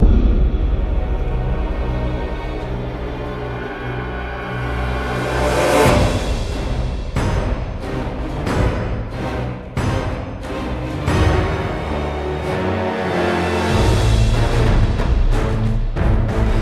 Combat - Cinematic; prince of persia the forgotten sands

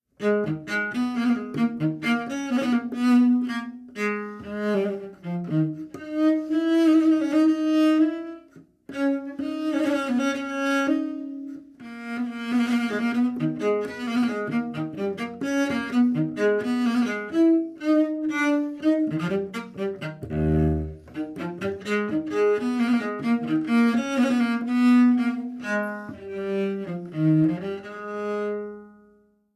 Contrabass; Assassin's Creed Syndicate

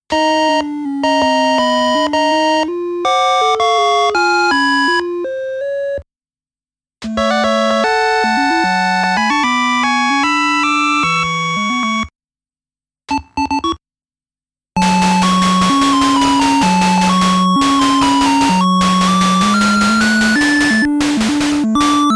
Restart~Stage Clear~Game Over

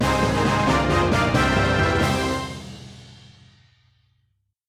Victory Fanfare

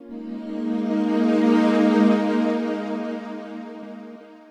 Pad; Dr Watson Pad; Proteus

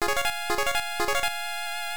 Fanfare (Fight Start); fire emblem gaiden family computer

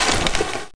player drop Medkit

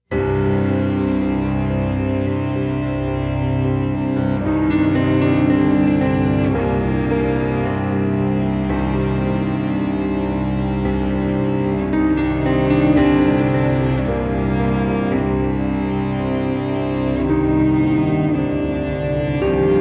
Funeral Dirge; Child of Light

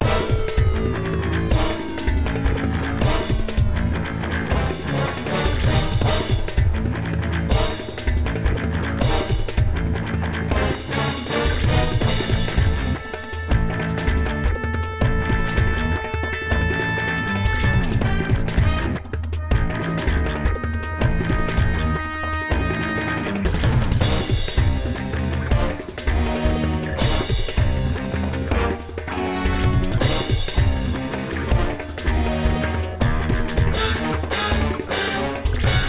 Combat Loop

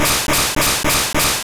Dig Start Loop; attack moves; pokemon; gen 2; gsc

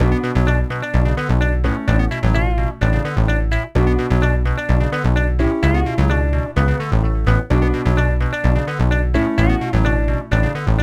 Electronic

